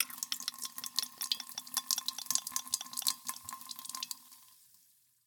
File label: Burst, glass, release, bottle, pour, fizz, cistern, empty, marble, slosh; Eiravaein Sound